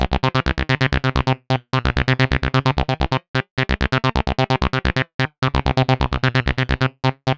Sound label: 130 C bass, Trance, Bass Loops; Trance Explosion Vol1